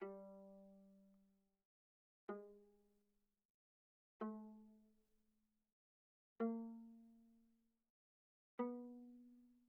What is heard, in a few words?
Viola pizz sul G mf G3 B3